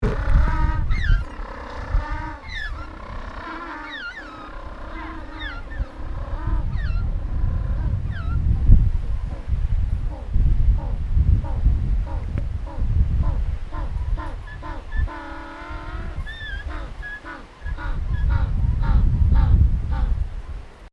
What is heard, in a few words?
penguins